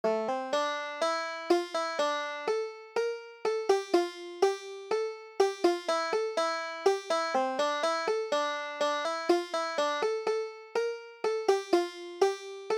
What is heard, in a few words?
bonnie prince charlie, banjo